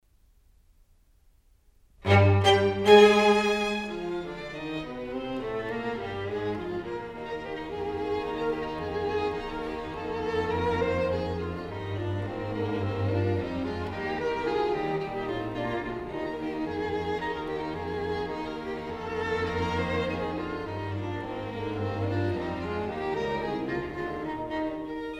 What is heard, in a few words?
String Quartet in E flat